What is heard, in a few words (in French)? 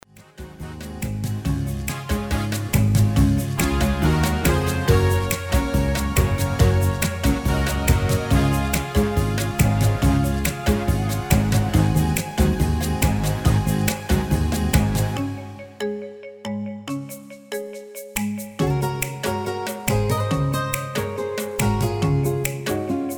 version instrumentale karaoké